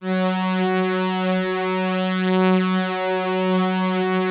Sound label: Atmo Pads; Best Service; XX Large